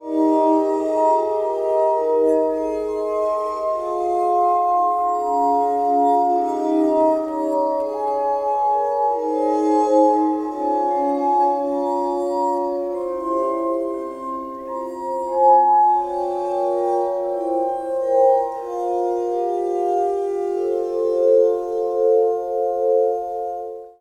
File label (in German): Bach Choral Harmonien